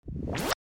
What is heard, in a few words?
Reload, Gun; ProjectZ